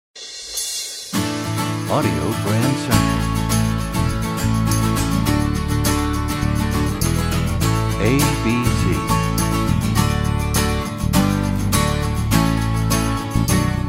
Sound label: Desert Wind, radio jingles